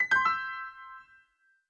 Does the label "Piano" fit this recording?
Yes